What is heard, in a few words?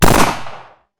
Gunshot